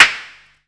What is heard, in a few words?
Percs